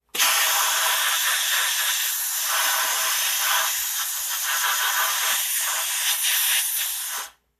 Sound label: Cleaning with air pressure